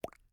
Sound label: hover bubble